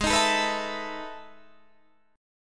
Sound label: E Major 11th chord